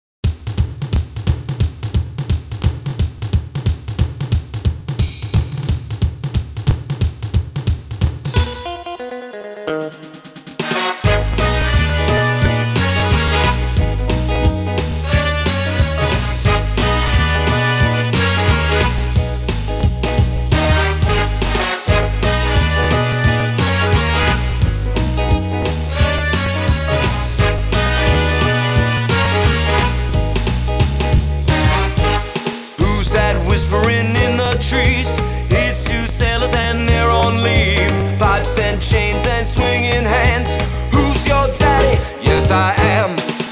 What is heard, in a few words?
swing